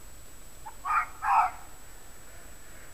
verso volpe vulpes renard roux rotfuchs zorro red fox